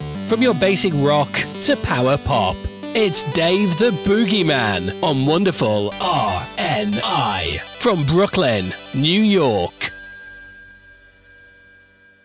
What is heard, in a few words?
Basic Rock to Power Pop ID, JINGLES